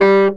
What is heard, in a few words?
B SAX STC G2, Baritone Sax Stacc; Orchestral; VarazdinOrchestral